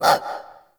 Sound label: Forest Open Glade Animal Far, Day